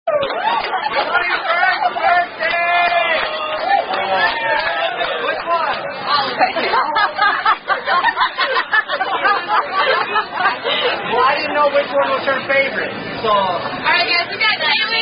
Happy Birthday By Wait Staff; Outback Birthday; Happy Birthday (Standard) By Outback Wait Staff

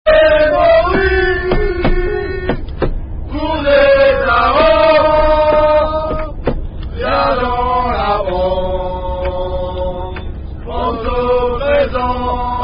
Rugby Chanson Paillardes, Bawdy French Rugby Song; Unknown Bawdy French Rugby Song

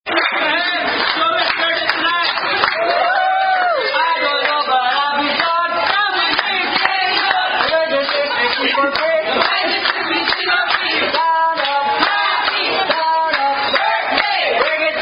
Happy Birthday, Tune Sound Off

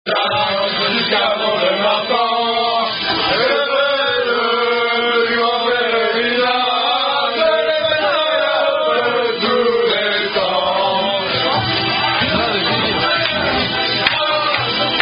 French Rugby Song; Match De Rugby Gars Pub Chateau; Unknown French Rugby Song

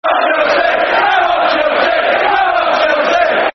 Come On Chelsea, Football Chants (Soccer Song)

